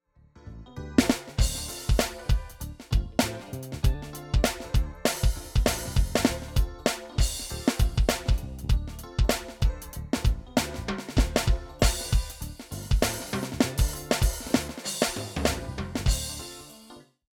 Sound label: Millenium MPS450 Backing